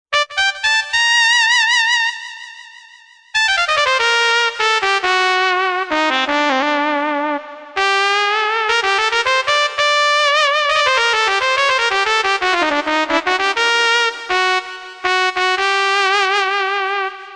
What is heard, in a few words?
trumpet